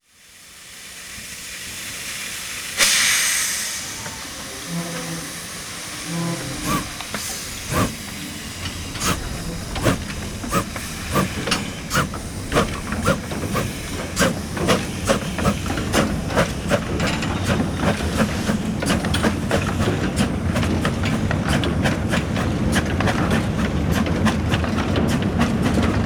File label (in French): trains a vapeur a voie etroite; sonotheque